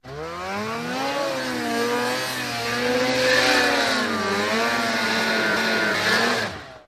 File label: Snowmobile Maneuver